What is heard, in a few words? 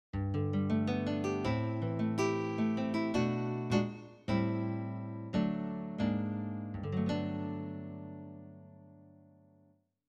Classic Guitar